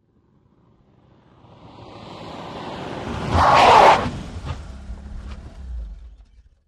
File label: Austin Montego Skid, aston montego ext.